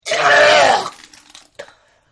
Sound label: Vomit; Vomiting; Human; Partners In Rhyme